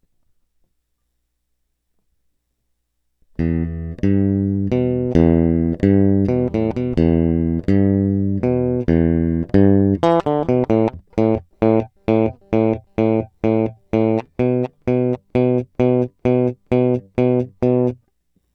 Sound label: Elect Guitar